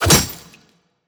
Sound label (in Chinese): 重攻击命中; 音效; 战斗